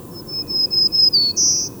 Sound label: Black-throated Green Warbler; ibm glen